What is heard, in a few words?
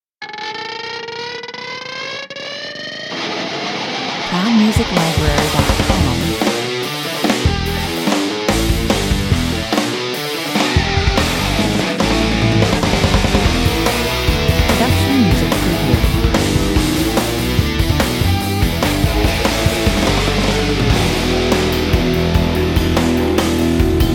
no lead guitar, 145 bpm